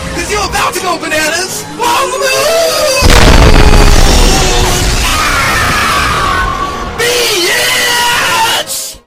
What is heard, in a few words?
moon cannon